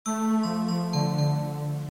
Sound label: Earcons Move; oviatt